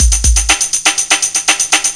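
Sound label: Arcade beat; Urban Shakedown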